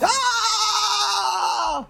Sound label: ahhh; hunter